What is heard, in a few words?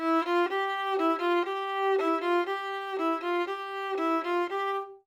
violin